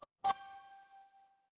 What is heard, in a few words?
magic scifi hologram